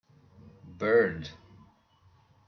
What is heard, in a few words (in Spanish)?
pajaro